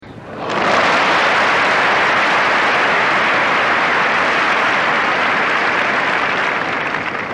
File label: applause